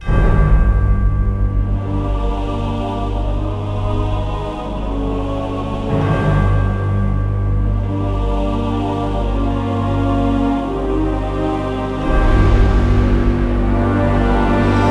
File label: creepy intro